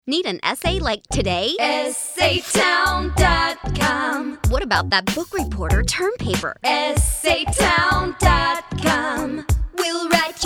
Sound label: jingle; essaytown